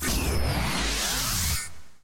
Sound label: jackpot relit; BALLY; sfx; TWILIGHT ZONE; Pinsound; Twilight-Zone-Full-Reorchestration